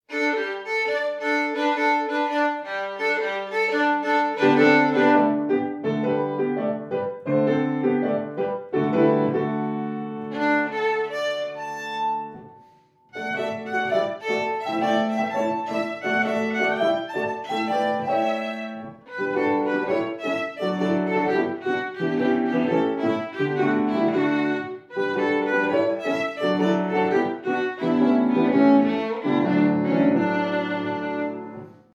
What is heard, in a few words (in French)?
saltimbanques, violon